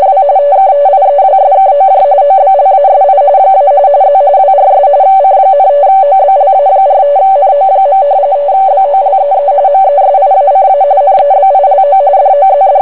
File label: rtty